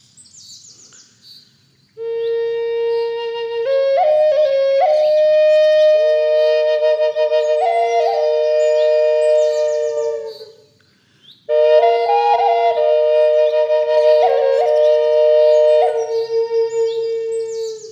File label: drone Lakota key si high, flute